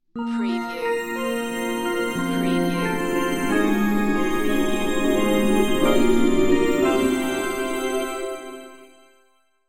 Scifi Audio Logo Ident, Shiny, Revealing, Glossy

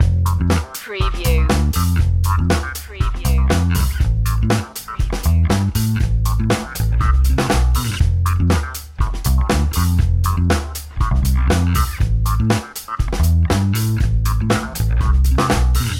funky time loop

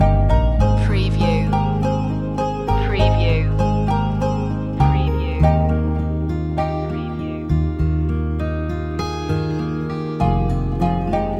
ancient city loop

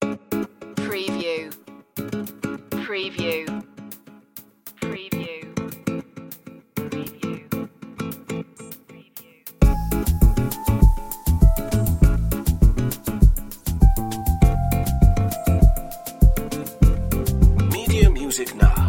bossa technova

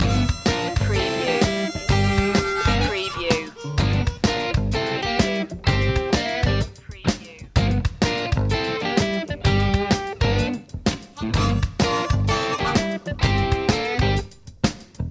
loop

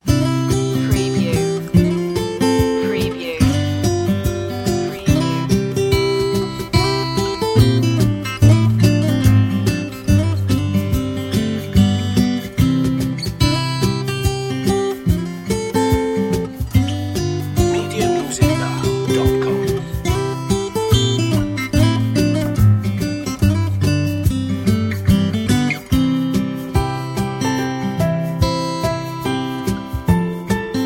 instrumental no sleigh bells

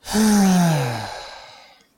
Sighing, Bored Or Relief, Male Relieved Sigh

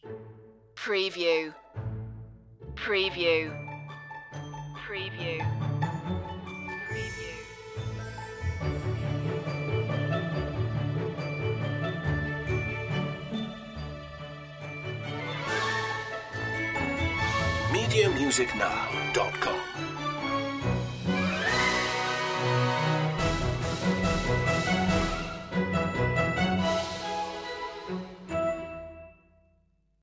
opera without a singer

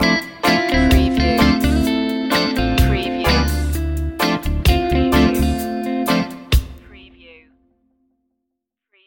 reggae love stinger

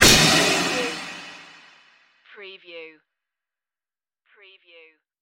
space bullet strike

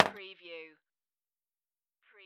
Pinball sound, ball drops

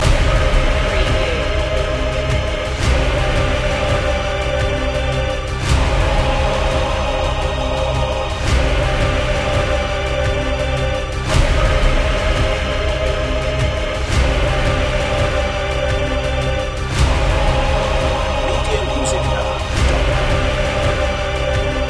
bad moon loop

